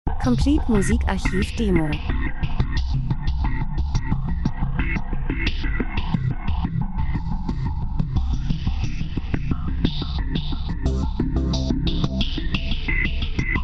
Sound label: drums; Elektro Percussion fremdartig Interessant Aufmerksamkeit Menschen Stadt Verkehr Reise Industrie Dokumentation